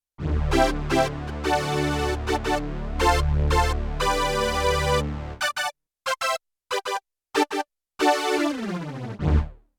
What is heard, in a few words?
Stab Brass, Yamaha TX16W; Synth Set